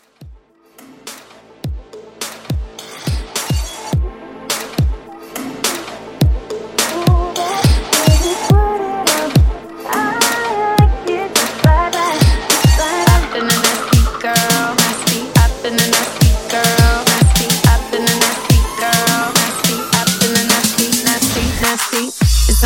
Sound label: Twerk Mashup